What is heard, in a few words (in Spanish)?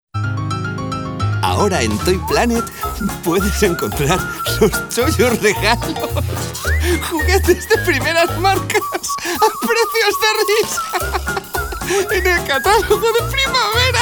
RISAS